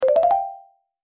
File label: Outcoming Call; Huawei Ascend G510